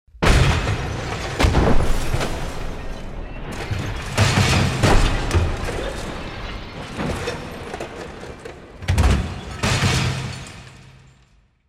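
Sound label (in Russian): серия разрушительных взрывов с осколками; Звук взрыва; Звук взрыва от бомбы или снаряда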